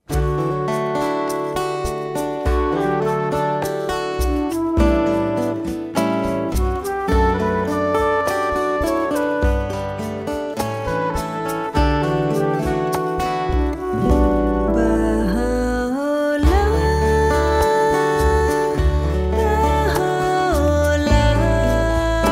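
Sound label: Song, French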